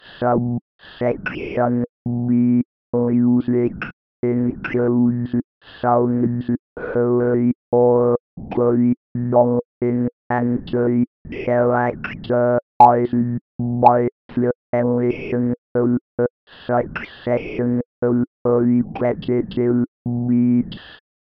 Repetitive Beats

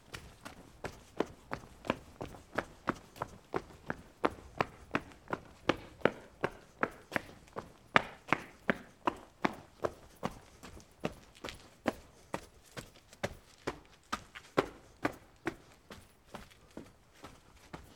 Under Castle Running

